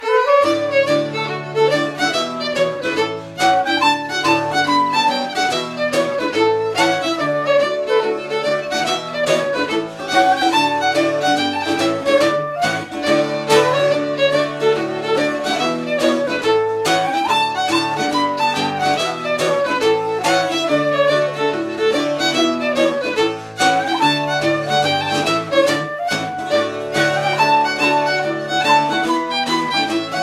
Orchestra Ambience; Assassin's Creed III

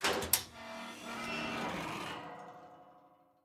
Metal Bar Doors Open; The Town

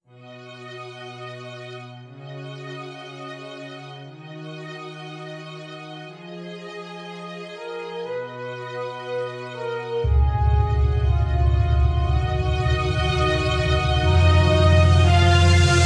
Orchestra; maji de watashi ni koishinasai original sound track; maji enbukyoku

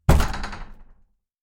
Minigame Release

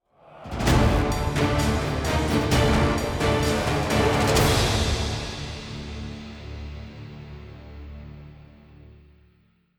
Bossfight Outro; The Witcher 3 Wild Hunt; Toussaint

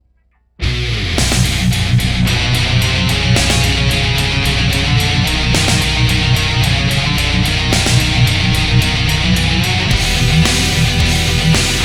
Instrumental; donkey kong country 2 diddys kong quest serious monkey business 2010; Nekofrog

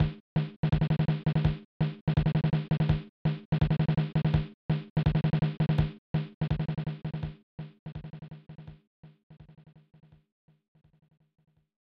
Drumbeat; fun n games 1994 snes

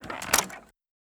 open door

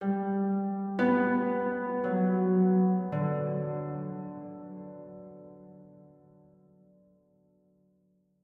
Harp Low; gamerip; that dragon cancer

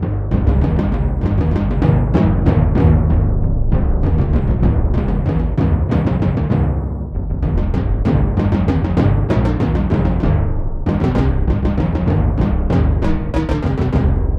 Drum

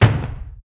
Death Body; portal quest; android; Shadow Minion1

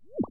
fish

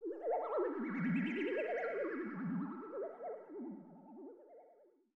aura end

